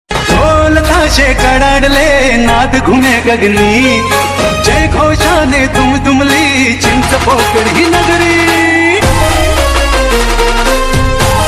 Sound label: Ganesh Chaturthi Ringtone